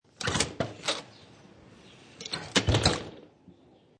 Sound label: Old Wooden Window Open And Close